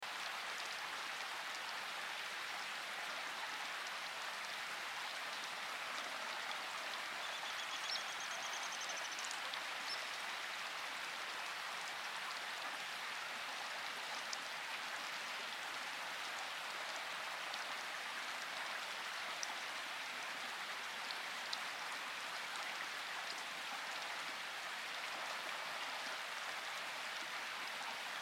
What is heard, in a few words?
rain in forest birds nature